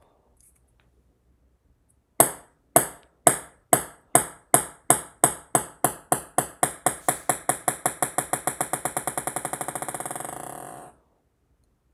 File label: pingpong